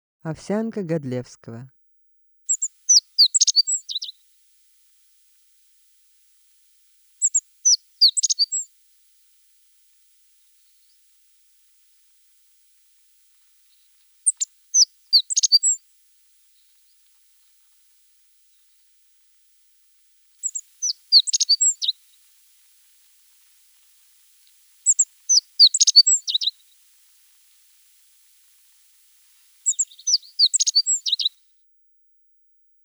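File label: Godlewski's bunting voice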